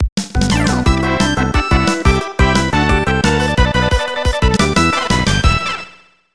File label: Win Friendly Match; Dino Dini's Soccer; SNES